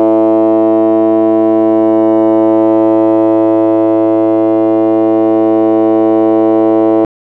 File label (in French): corde la guitare